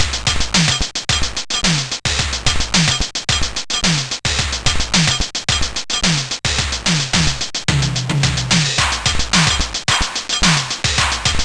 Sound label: Digi-Drums, sid MOS6581R4